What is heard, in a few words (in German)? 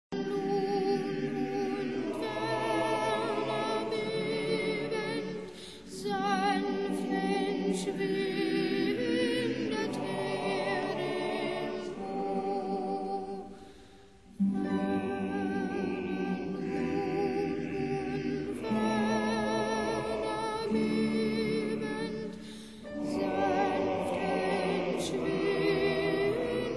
Chor Jubilate